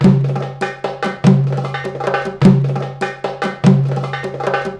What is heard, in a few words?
PERCLP, ArabianTraditions; USB Soundscan vol.43; AKAI